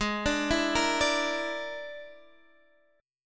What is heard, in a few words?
E 7th Flat 5th, Ab